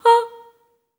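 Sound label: staccato A#4, soprano, Voices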